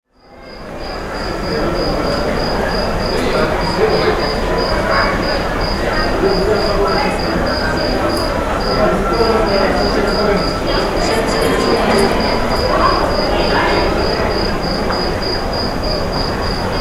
AMBIENCE, Arabian Traditions; USB Soundscan vol.43; AKAI

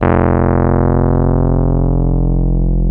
F 0, Electric Pianos; Wizoo Electric Pianos

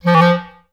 F2, Advanced Orchestra; Best Service

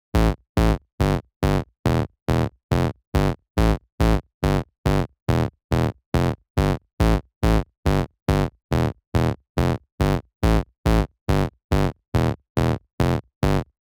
140 BPM Bass, Root A; Vengeance; Essential Clubsounds Vol.5; Demo Constr Kits; Nessi Kit; Bassline

